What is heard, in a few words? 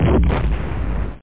new distant destruction; alienbreed3d2